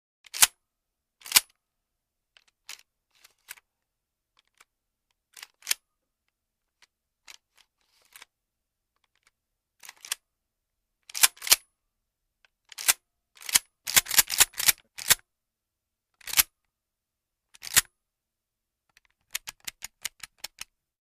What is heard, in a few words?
12 Gauge Shotgun Pump; mvmt